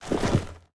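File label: creature bodyfall small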